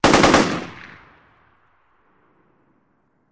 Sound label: Machine Gun Sngl Burst; bursts